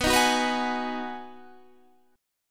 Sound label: B 6th B, guitar chord